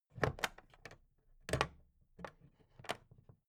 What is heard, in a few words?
plastic clasp handle open soft